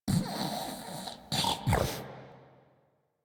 Snorts; Warframe; Deimos; Father; Vocalizations; en

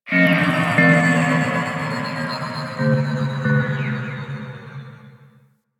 Corpus Ship Exterior Satellites, Ambience; Warframe; Corpus Ship Remaster

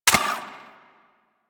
Braton Prime Fire End; Warframe

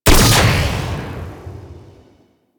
Railgun Fire

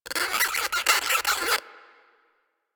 Forest Rodent Squak; Warframe; Ambience; Grineer Forest

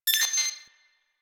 Tech One Off, Ambience; Warframe; Rail Jack

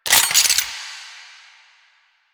Heavy Shotgun Reload Grab; Weapons; Warframe; Tenno

